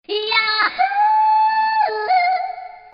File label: Yahoo Cute